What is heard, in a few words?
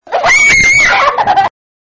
child scream